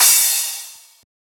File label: Crash